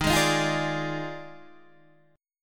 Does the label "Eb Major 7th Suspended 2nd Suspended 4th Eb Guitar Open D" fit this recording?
Yes